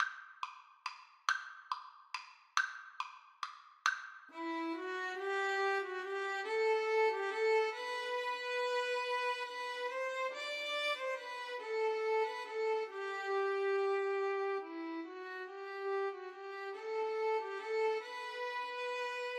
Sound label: siciliana violin duet